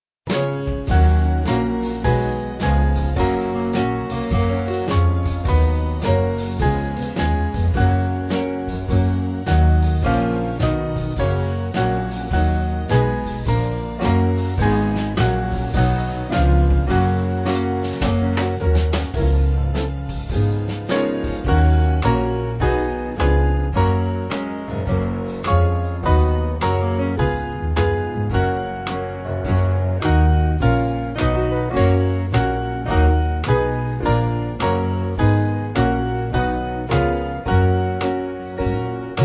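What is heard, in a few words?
Band, Free Music Backing Files for Churches; Stand Up And Bless; St Michael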